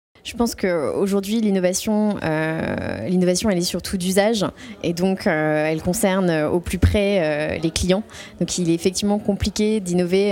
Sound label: crowd